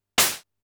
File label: crash, Drum Machines, Formanta Radio Plant Polivoks